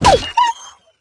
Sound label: Battle (Wolfskin Damaged)